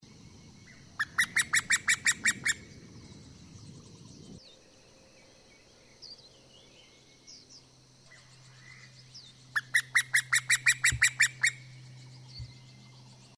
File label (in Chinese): 中杜鵑; 筒鳥; 杜鵑科/夏候鳥